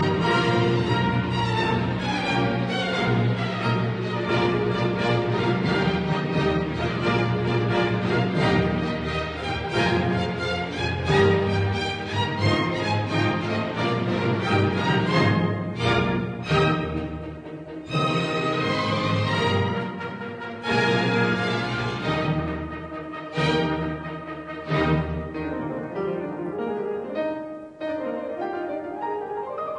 Piano Concerto in E flat major Emperor Rondo Allegro; No 5; Op 73; Walter Gieseking